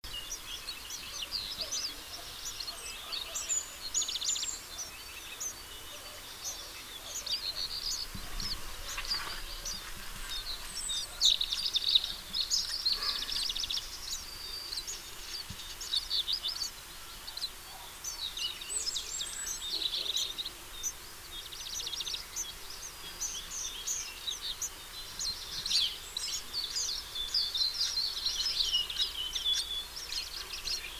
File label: Passer domesticus; SETE